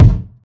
phat bass drum single shot e key, bass drum one shot tuned to the E note; kick drum samples